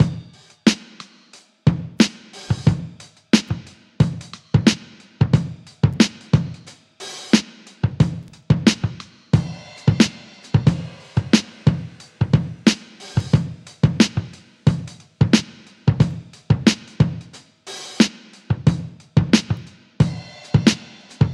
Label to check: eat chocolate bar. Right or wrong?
Wrong